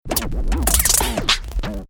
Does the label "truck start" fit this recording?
No